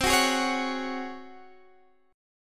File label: Db Major 7th Suspended 4th C